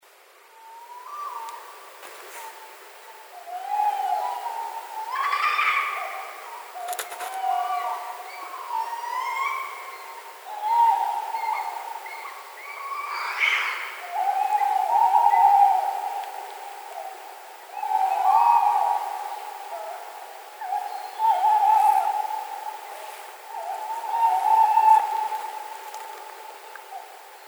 puszczyk zwyczajny Strix aluco

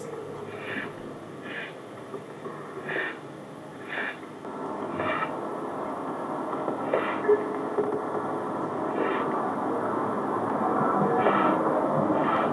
syczek ostzegawczy

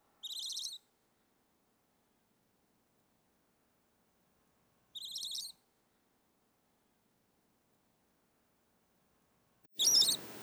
piskle; Str Drzewce

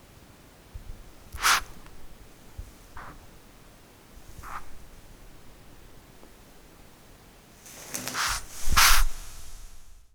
odstrasza, sowy

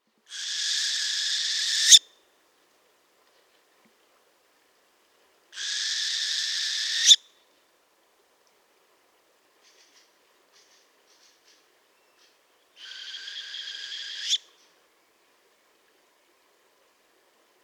sowa jarzebata Surnia ulula

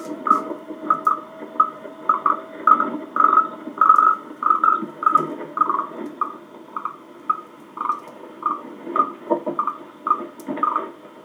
syczek kontakt

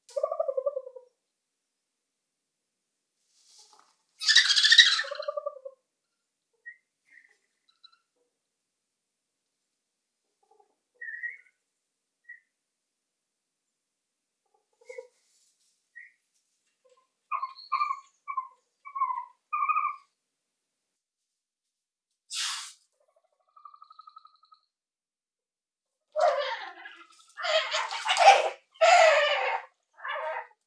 Uszatka glosy socjalne

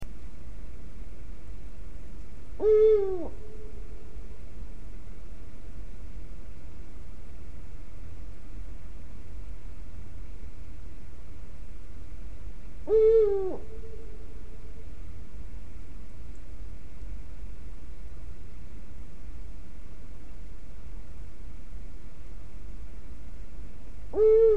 puchacz zwyczajny Bubo bubo

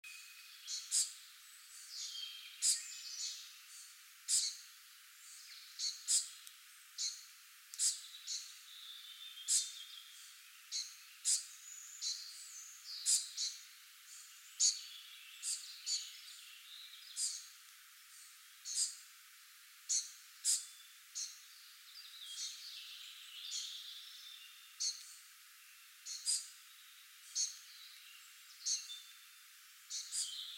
wlochatka piskleta poza dziupla

